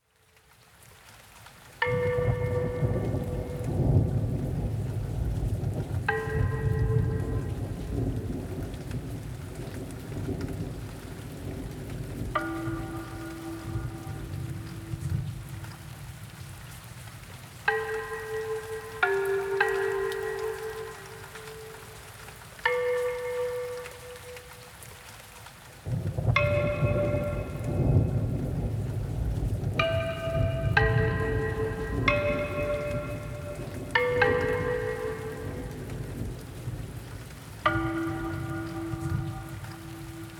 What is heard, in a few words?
asian bells and thunderstorm